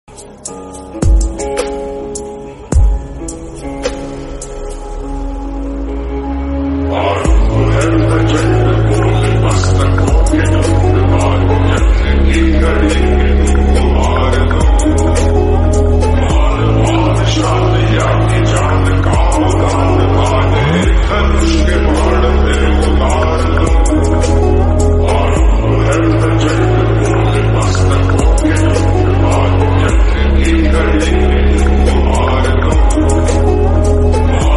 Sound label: Lofi Remix Motivational